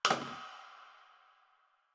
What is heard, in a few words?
Janitor Plastic Spray Bottle; Warframe; Ambience; Nineteen Ninety Nine